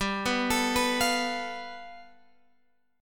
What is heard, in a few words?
G 9th, Guitar Open G